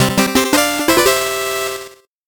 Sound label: 8bit jingle normal